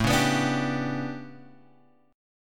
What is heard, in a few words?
A 11th A, guitar chord; A11 Chord {5 4 X 4 3 3} strummed